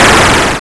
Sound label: fall in fire; Lynda C Game Programming 2